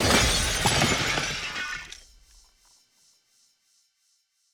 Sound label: UI Menu SFX Emblem Layer2; Monster Jam Steel Titans